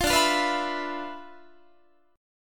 D Minor 6th Add 9th D, Guitar; Dm6add9 strummed; 20,22,20,21,20,22

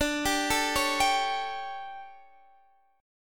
D Major 7th Suspended 4th, chord

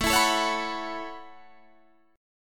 A 6th Add 9th A down Guitar Open D

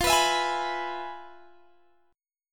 F Minor Major 7th Flat 5th Guitar Open G

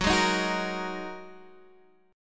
G sharp 7th Suspended 2nd F sharp

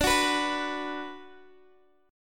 D 6th D down Guitar Open D, chord

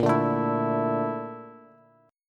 A sharp Major Sharp 11th, Bass, Bass Standard; A#M#11; strummed; JGuitar

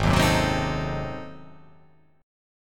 G sharp 7th Flat 9th G sharp down, chord

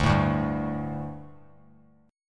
D Suspended 4th D, Bass Standard; strummed

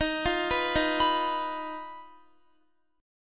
Eb Suspended 2nd Sharp 5th, Guitar; Ebsus2#5 arpeggiated; JGuitar

